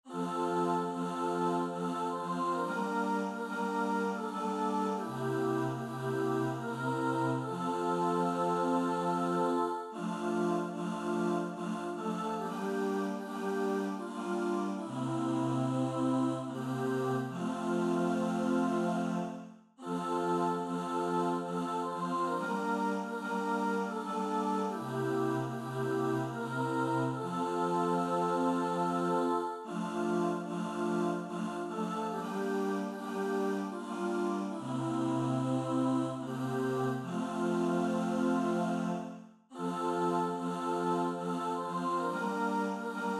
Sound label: satb